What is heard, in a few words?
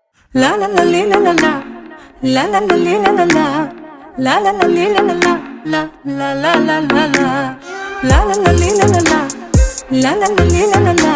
lala li lala ringtone